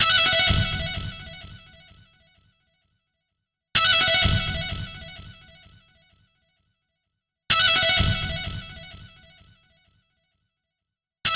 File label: Headshot Delay; orcs must die gamerip